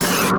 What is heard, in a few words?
Static; Blastwave